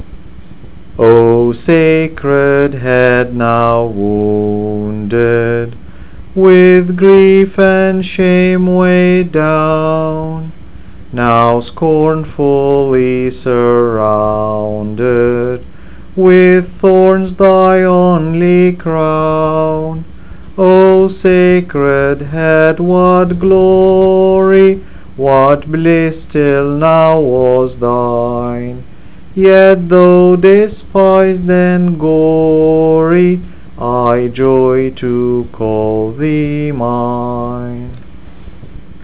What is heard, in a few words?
Hymn; Sacred Head Now Wounded